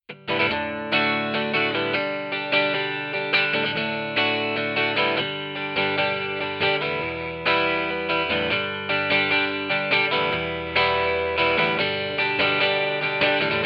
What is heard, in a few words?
EL GTR stereo; Sontronics; APOLLO 2